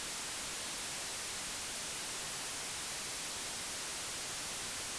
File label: Noise